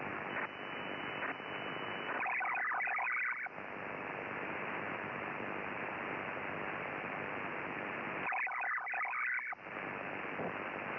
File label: ALE USB